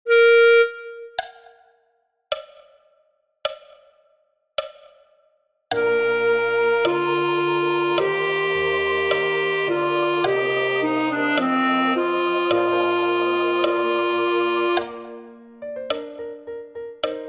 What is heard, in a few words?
Kyrie Alto; Haydn; Missa Brevis Sancti Joannes de Deo